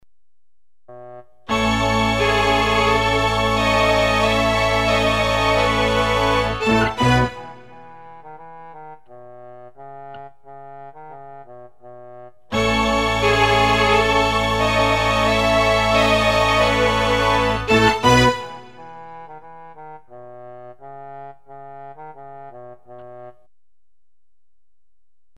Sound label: STRINGS